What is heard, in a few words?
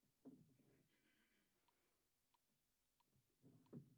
Vocal